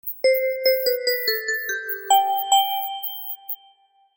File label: La Cucaracha